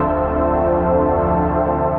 Darkness Pad; Synth Pad; Ableton; Factory Packs; Synth Essentials

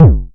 analog quick, Kickbank; mpk mini presets; Ableton Foundation FreePacks

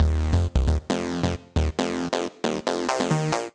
Fuzz Pluck (F) 135bpm; Music Loops; Sledge Fuzz Pluck; Ableton; Factory Packs; Singularities

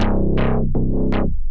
Bass Sequence, Wavetable; Instruments; Backside Bass Sequence; Ableton; Synth Essentials

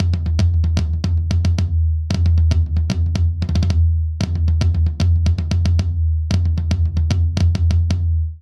Polyrhythmic Dance Floor Tom Only 114 bpm; MIDI Clips; Ableton; Factory Packs; Drum Booth

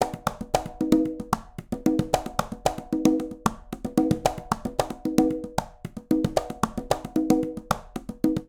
Calypso Conga 113bpm, Latin Percussion; Ableton; Factory Packs

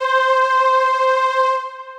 Strings Female Voice, Roland VP-330; String Machines; Ableton; Factory Packs; Retro Synths

